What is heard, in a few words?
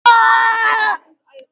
little boy screaming